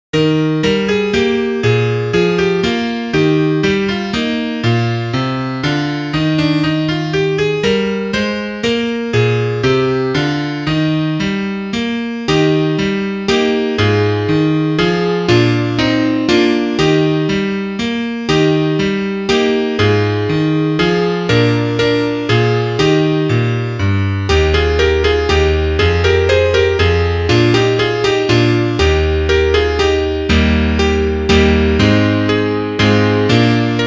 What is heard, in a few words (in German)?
Klavier Solo, trompete; Duett